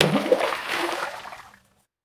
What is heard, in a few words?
hand splashing water in a tub